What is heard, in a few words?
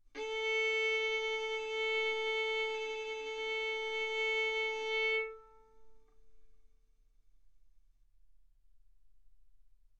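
Violin arco mf A string A4 B4 stereo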